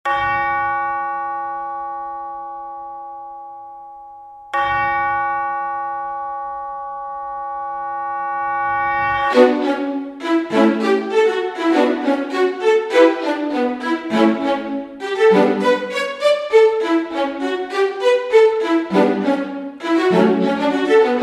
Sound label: middle ages ringtone